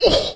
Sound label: zombie hit; Undercroft; enemy